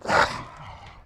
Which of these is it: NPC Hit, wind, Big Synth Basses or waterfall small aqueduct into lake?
NPC Hit